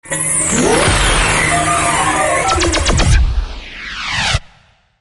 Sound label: Laser Tone Alert